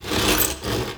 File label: Witch Station Workshop Rattle; Ambience; Soul Plane